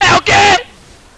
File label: knife; wttsounds